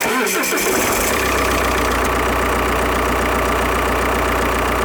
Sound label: alfa romeo idle